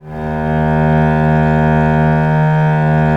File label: VL D#1, Historical Instruments, VIOLE; VIOLE 2V; AKAI; USB Soundscan vol.23